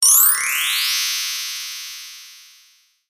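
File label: transform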